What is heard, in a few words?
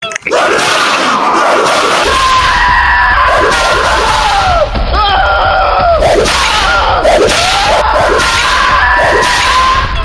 belt smacking